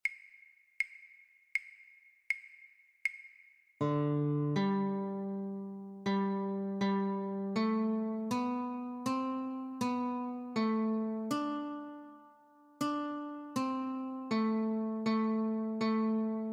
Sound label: Notes, guitar; Gloucestershire Wassail; christmas